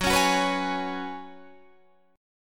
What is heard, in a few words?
Gb Suspended 2nd Suspended 4th, Guitar Open D